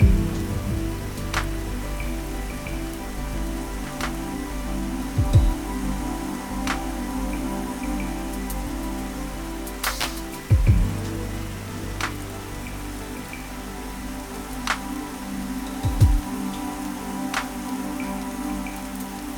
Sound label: Loop